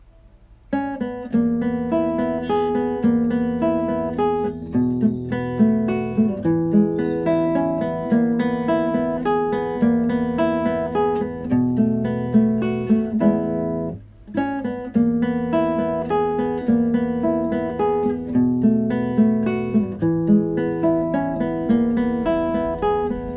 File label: Waltz; Berg